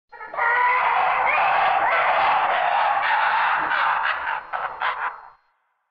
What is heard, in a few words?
pteranodon